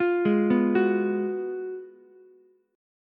Gb Major 7th Suspended 2nd Suspended 4th Gb Ukulele; arpeggiated; JGuitar